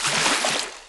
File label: water